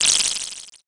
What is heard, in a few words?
text anim